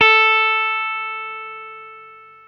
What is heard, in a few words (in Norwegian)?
gitar